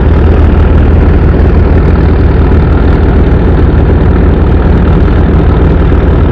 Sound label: Vehicle Engines; Red Orchestra Ostfront 41-45